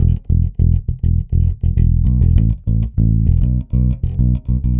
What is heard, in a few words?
Pop, Bass